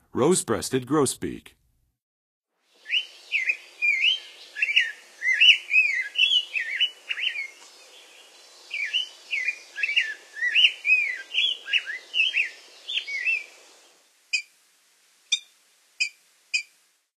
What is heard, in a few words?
Rose-breasted Grosbeak; North America; Western USA; Western USA Songbirds